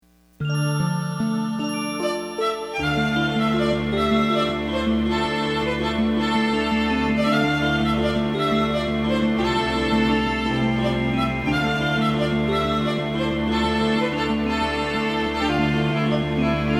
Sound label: Celtic Dance